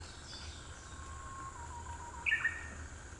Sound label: bird